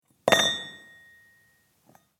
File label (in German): Metallstueck